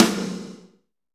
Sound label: SNR, Snares, Roland